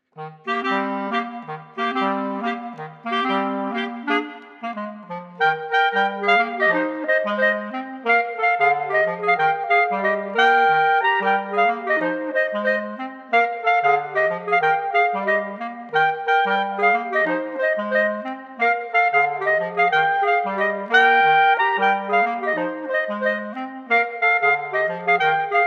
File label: Clarinet Trio